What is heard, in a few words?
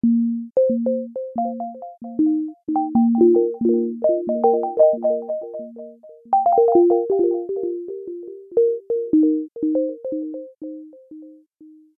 chimes delay; nyquist